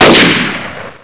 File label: sniper fire; weapons